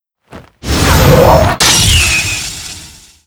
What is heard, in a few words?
mage skill